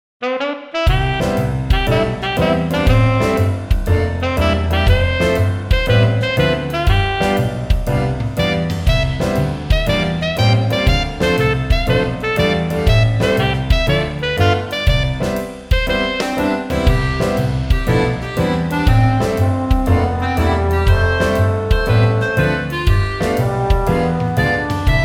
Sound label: jazz